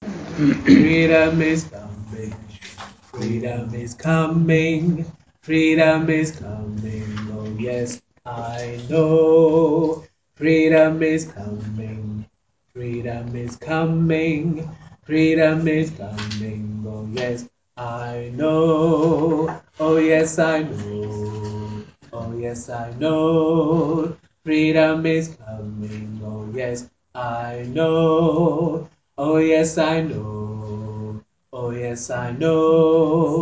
bass, gospel choir

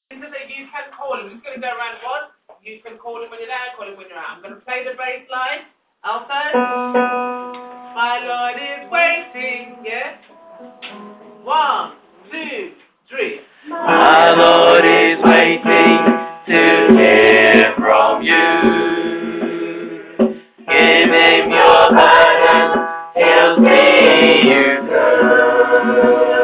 Bass, gospel choir; My Lord Is Waiting

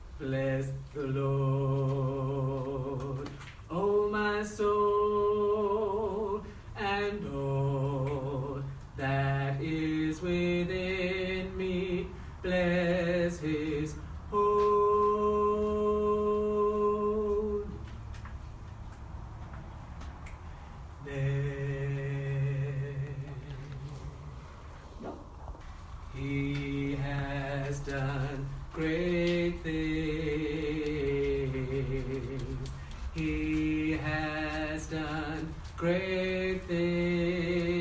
Basses, gospel choir

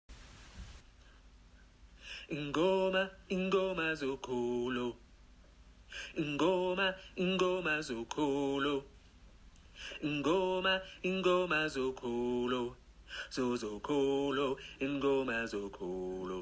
Alto, gospel choir; INGOMA

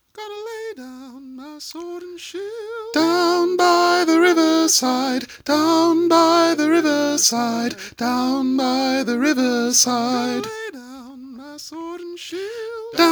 Down By The Riverside Alto

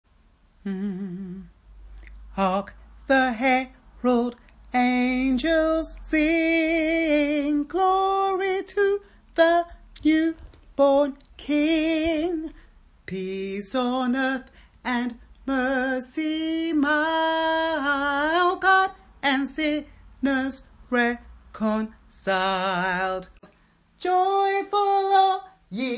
sops, gospel choir; Hark The Herald Angels Sing

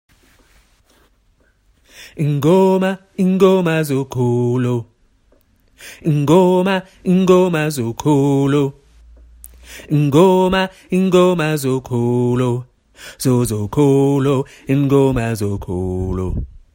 Ingoma Bass

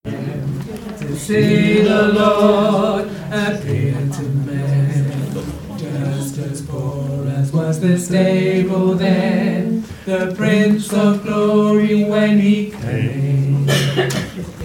Bass, gospel choir

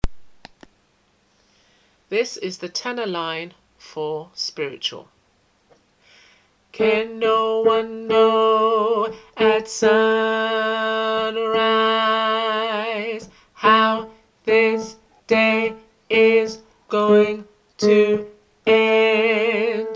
Spiritual Tenor

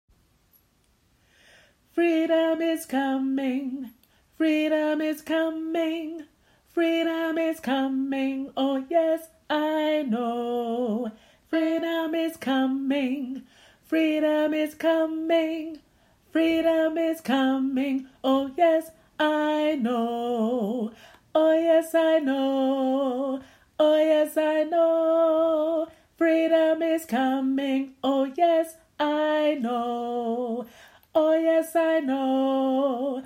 ALTO, gospel choir

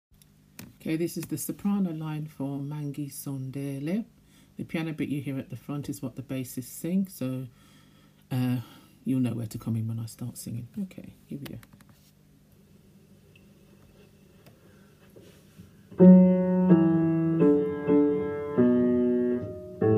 SOPS, gospel choir

